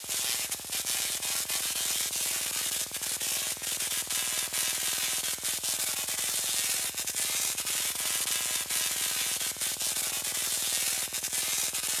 Electricity Status Effect Loop

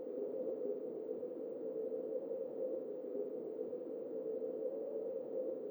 Fly Forward; Google Earth VR

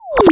UI Menu Confirm Out; Skylanders Cloud Patrol; Arcade, iOS, Mobile

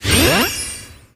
Enemy Weekpoint Expand

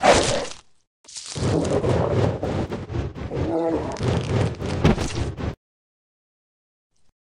Kill Player; Lethal Company

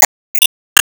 Enemy Ufo block Common Delete

